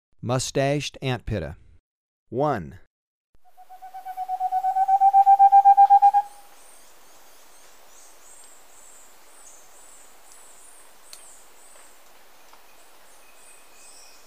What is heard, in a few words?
Moustached Antpitta, Birds of Ecuador; S. America